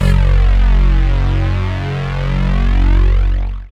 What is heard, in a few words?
SYN TECHNO, dance synth; Sound & Vision - Gigapack I CD 2